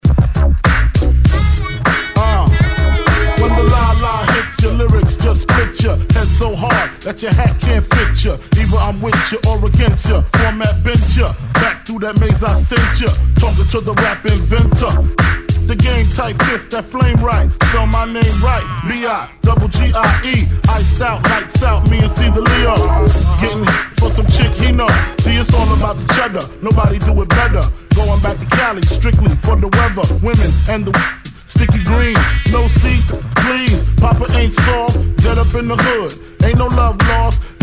90s Intro 99 Bpm

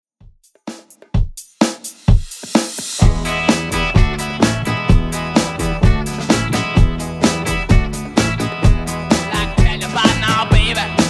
60s Redrum 128bpm

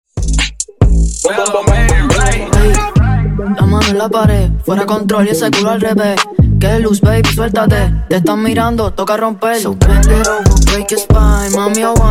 70Bpm

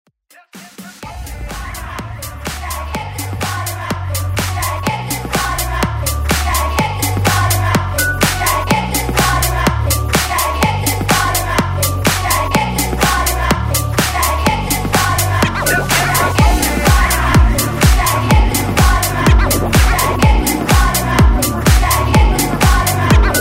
Party Bootleg Rework 125 Bpm Dirty

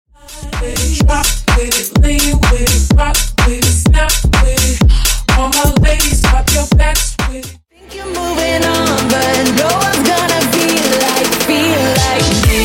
Pop Club House Megamix 126 BPM Clean

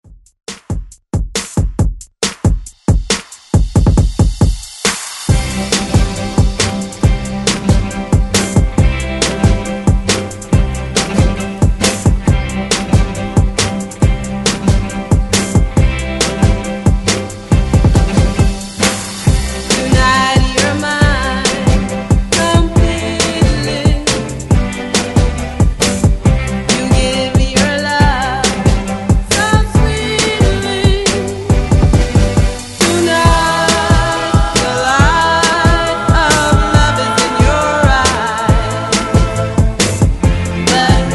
60s re drum 136 Bpm

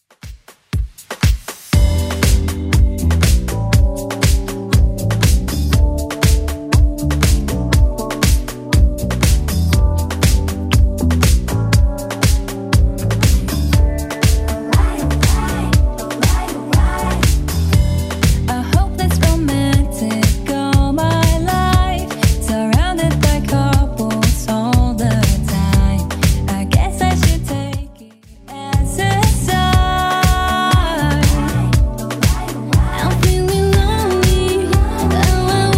Top40 Extend Redrum 120 bpm

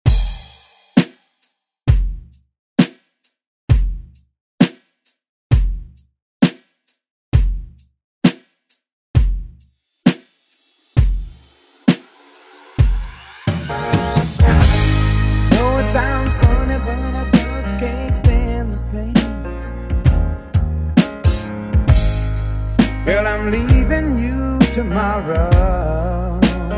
70s ReDrum; DJ Mhark

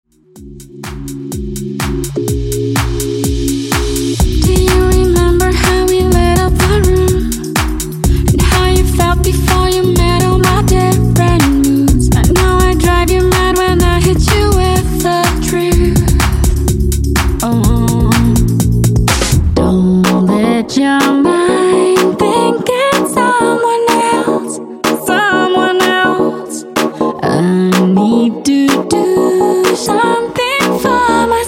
Club Mix, 125Bpm; Top40

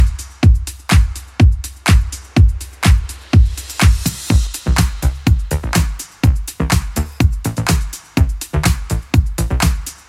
80s Club Edit 124bpm

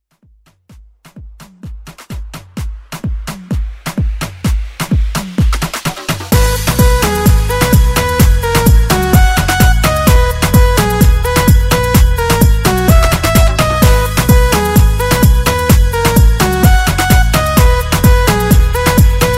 Afro Redrum

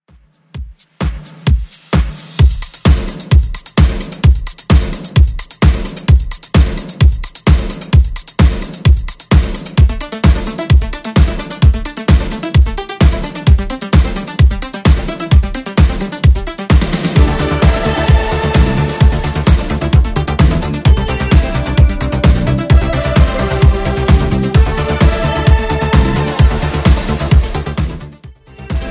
80s Redrum 130bpm

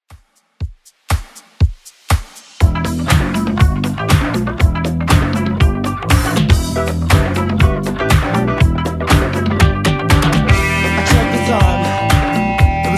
80s 120bpm; Redrum

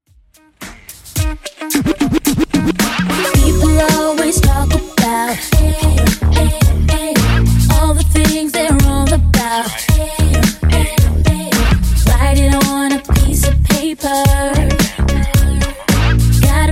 110bpm

